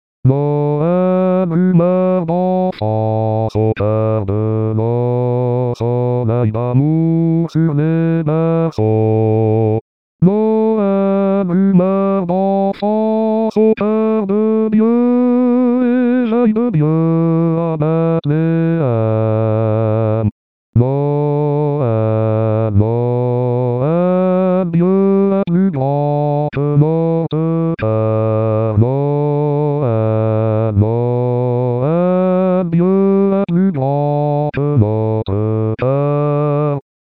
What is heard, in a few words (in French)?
Basse, Chorale; Noel